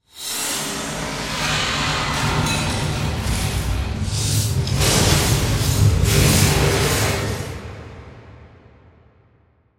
Composition, Suction, Fragments, Whoosh, Production Element, Imaging Element, Accent, Transition; BlastWave